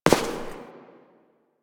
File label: Step; Warframe; Koumei